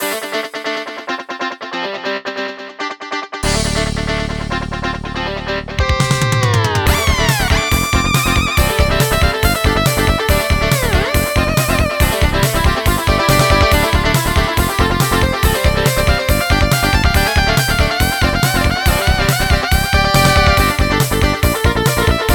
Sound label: Progressive Rock; Incredible Machine 2